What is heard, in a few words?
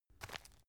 tissue package pick up